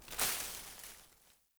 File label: Surface Body Roll Vegetation; Player; Surface Body Impacts